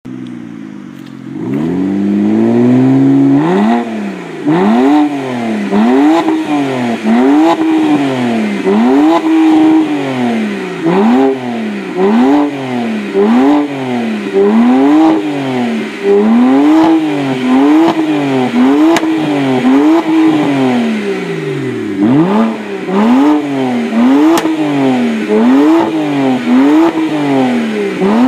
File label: bmw 335i